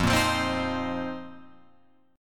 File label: F 7th Suspended 4th F down Guitar Open F